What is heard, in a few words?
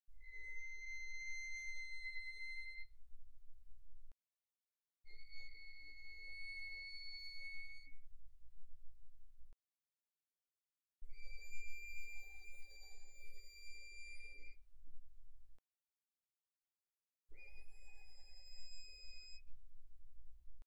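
Violin arco pianissimo sul E C7 E7; Strings; violin2012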